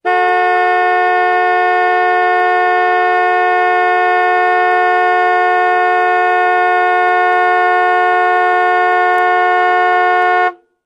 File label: Car Horn Honks Large